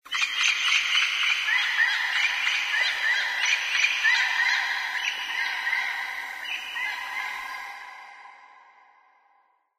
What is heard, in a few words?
animal call bird; Source Engine; tf; lair